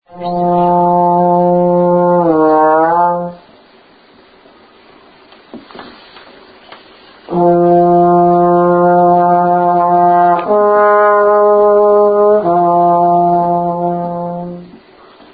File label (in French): trombone